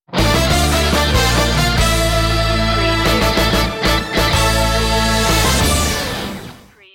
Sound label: sports jingle